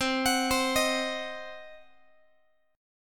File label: C Diminished, Guitar, chord; arpeggiated; JGuitar